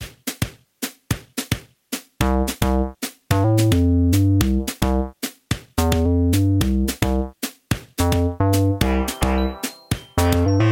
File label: Casual Game Intro Music